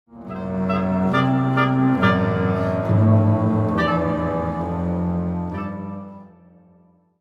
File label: Play Stinger; Soulciologist Malicia